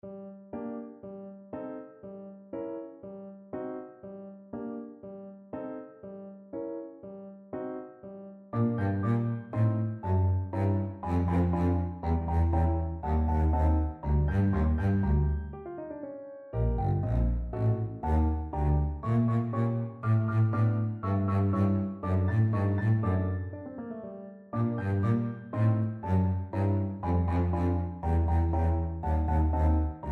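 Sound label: tchaikovsky sugar plum, Double Bass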